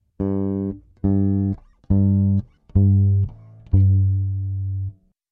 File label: Electric Bass Same Note Different String; Bass Tone